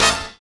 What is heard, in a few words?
HIT Band, Big Band Hits